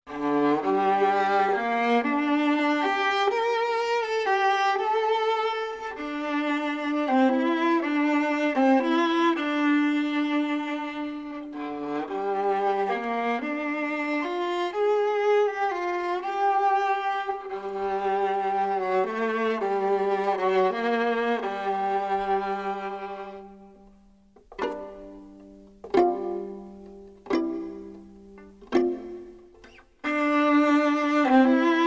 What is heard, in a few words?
viola